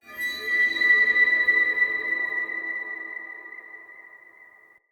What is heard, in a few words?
Day Texture, Ambience